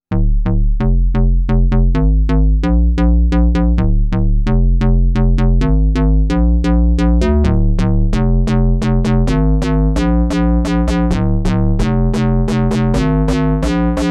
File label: KORG Node - Plasticine